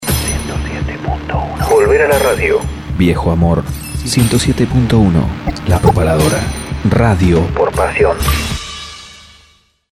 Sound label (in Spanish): rock